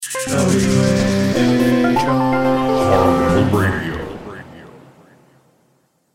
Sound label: wahr soft